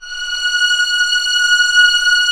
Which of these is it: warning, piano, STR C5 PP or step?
STR C5 PP